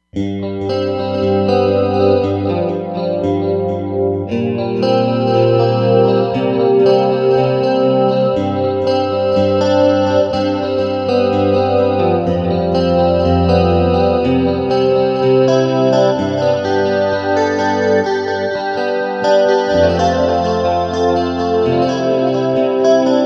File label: Strat, Roland Fantom XR